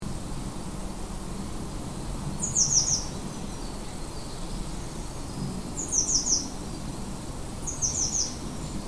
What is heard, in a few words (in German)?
Gebirgsstelze